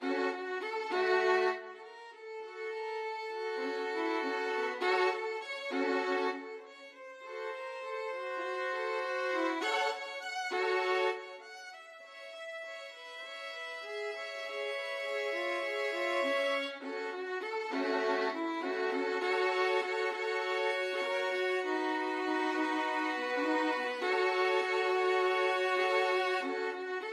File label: VLN, violin trio; Didnt My Lord